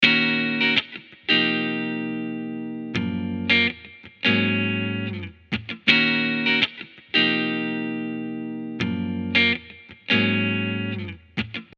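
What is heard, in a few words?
gtr, guitar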